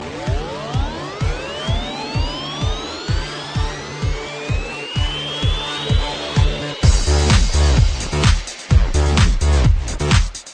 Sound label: xperia remix, ringtone